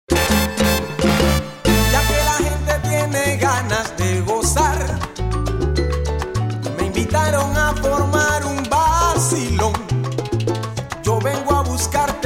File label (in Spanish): Guaracha y Bembe